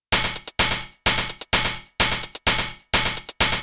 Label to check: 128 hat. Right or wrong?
Right